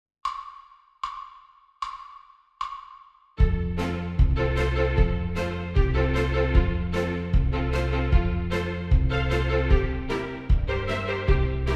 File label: Go Tell Aunty Horns in Fifths